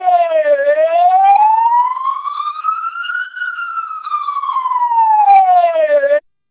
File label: siren, TWELP 2400 bps